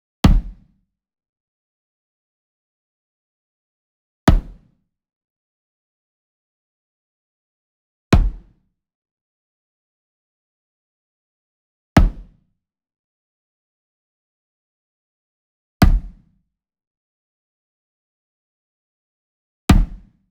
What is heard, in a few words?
Wood Box Hammer Hit Near; SWEETENER; boom library; Cinematic Strikes Construction Kit